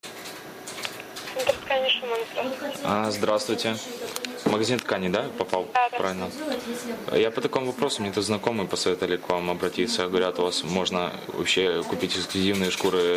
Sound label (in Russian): кошки